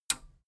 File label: metal button radio release disable